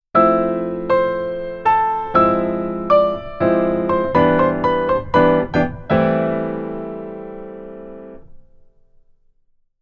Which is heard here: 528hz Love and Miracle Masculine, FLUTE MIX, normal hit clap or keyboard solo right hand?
keyboard solo right hand